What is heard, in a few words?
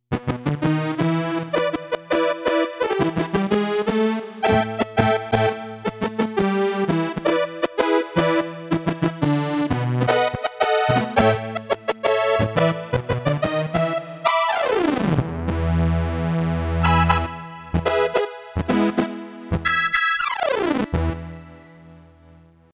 Brass Ens, Casio CSM-1; Tones